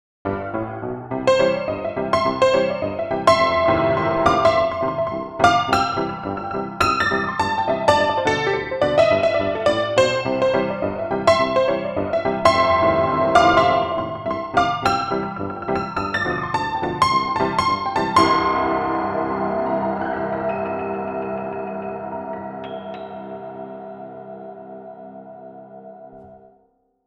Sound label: K2 Far West MONO Piano